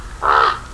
raven

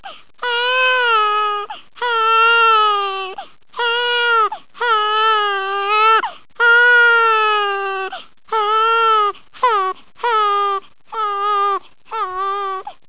baby; Hear baby cry.

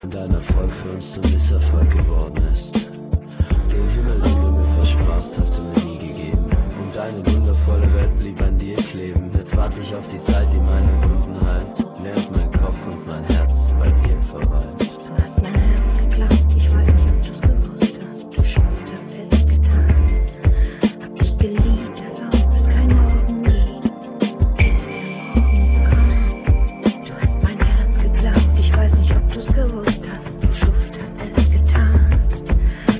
solo

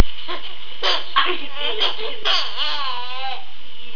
laugh

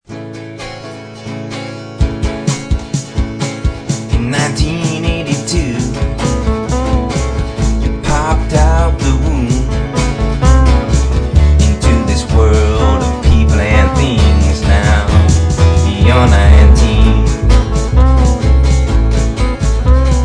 blues

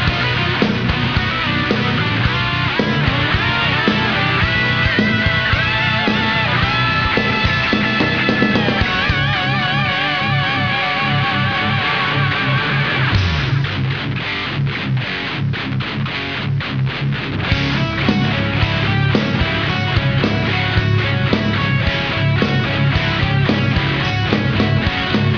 grooves

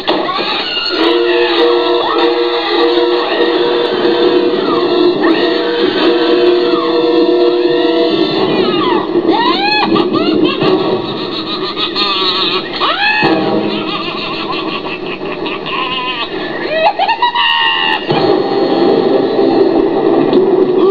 Cackle

